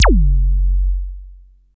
FX, attacks

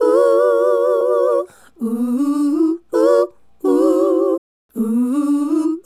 Female Group, Hip-Hop and RnB Vocals

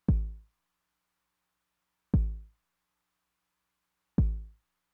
kick drum, Roland TR-909; drum machine; Roland TR-909 Ableton Project

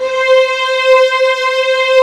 AC STRING; STRING+CHOIR; Pro-Rec; Synthsonic

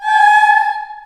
G#4 CHS EH, CHILD EHS, Choir; Acoustic & Synth; AKAI; USB Soundscan vol.28

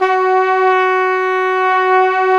WND FLG SO, Hybrid Winds Flugel & Sop

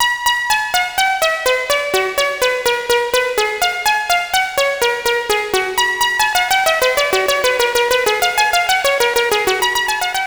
SEQ, SYNTH; Keith Emerson Signature Series

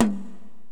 Korg x5, Drums

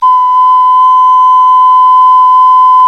Clay Flute; Roland LCDP11 Africa VOL-1; Afro Pipes

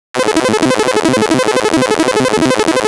Speed FX; AMG - Prototype Drum & Bass

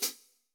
brush hat; Greytsounds; Scott Peers Sound Engineering

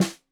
Snare 65 Reg Stereo, Deeply Sampled Drums

